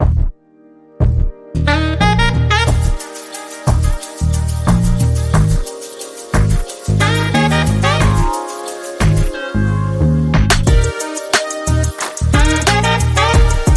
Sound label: Sassy Saxophone Full